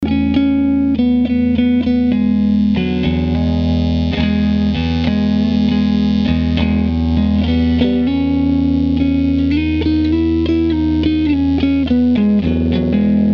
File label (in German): Gitarrenbrummen